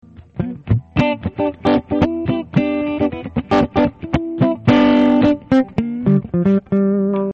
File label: gibson both clean, Les Paul, Pickups beide, CLEAN